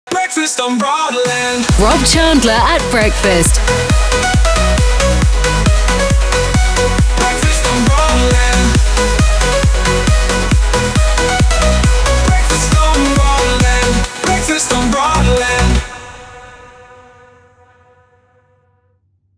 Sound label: Theme Donut, Hybrid AI Sung Jingles; BOB